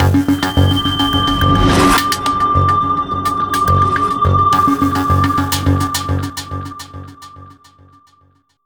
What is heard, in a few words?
Mission Music Stinger Intro; starcraft 2 wings of liberty